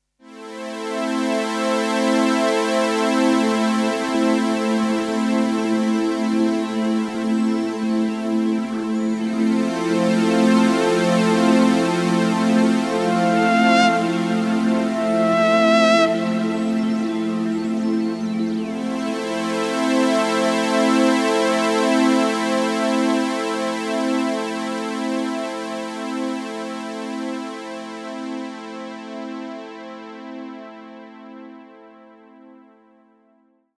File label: Roland JX 08 VS Hydrasynth VS Iridium